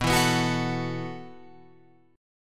B 7th Suspended 4th B down Guitar Open F; B7sus4; strummed

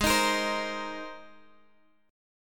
Ab Major Add 9th Ab Guitar Open F; Abadd9; strummed; 20,22,22,19,x,x

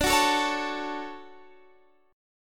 D-Major 7th D down Guitar Half Step Down

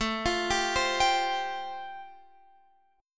A-Minor 7th Sharp 5th, chord; x,12,15,12,13,15; arpeggiated; Am7#5

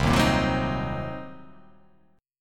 C Minor 7th Flat 5th, chord

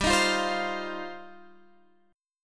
G 7th Suspended 4th Sharp 5th G; x,x,17,20,17,19,19,x; down

